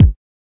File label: kik